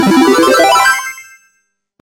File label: level up